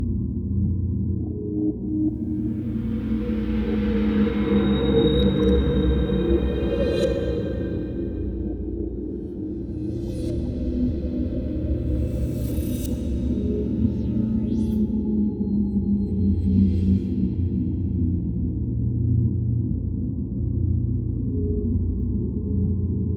ambient crisis extradimensional; System VFX

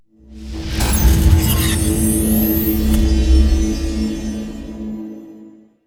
archeology event finding loot; ancient relics

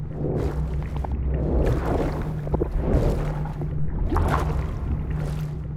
aqudragon reanimated moving; Stellaris

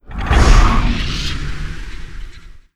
sfx destroyer moving out; Stellaris; nemesis

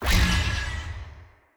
sfx alert; composer of strands; Stellaris; shroud

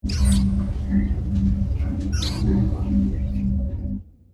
ship bridge; Stellaris

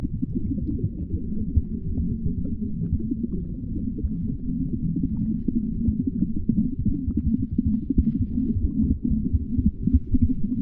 amoeba reanimated idle; Stellaris

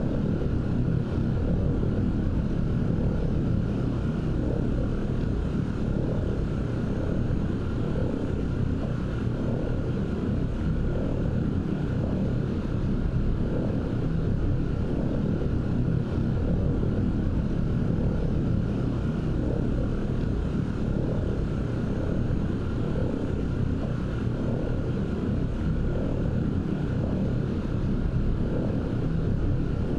ship transport ship idle; Stellaris; machineage; ships

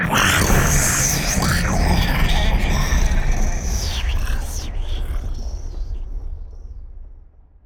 lithoid portrait snake; lithoids; Stellaris

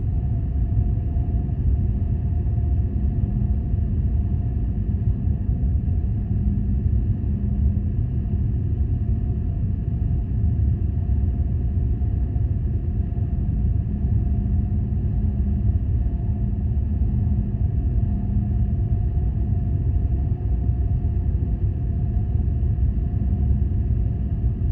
ship idle; Stellaris

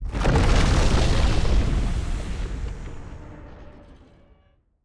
void worms nest destroyed; Stellaris; grand archive; ships

